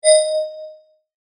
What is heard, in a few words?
photon Emit Visible; molecules and light; greenhouse effect